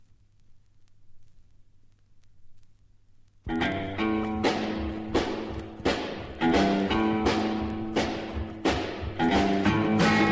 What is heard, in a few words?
Hot guitars